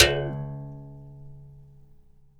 BIRIM TO CL, BRAZIL

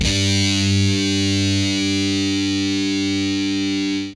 POW CD FUZZ, Guitar; Quantum Leap

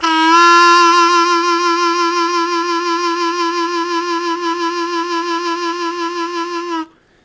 Strong Vib w Scoop F1, Harmonica; Zero G

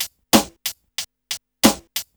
90 BEAT, Hip Hop R n B

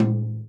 tom, Real Drum Kit, jungle kit

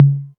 808 Tom Mid; Novation Drumstation

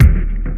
kick, ragga dub reggae; USB Soundscan vol.27; AKAI

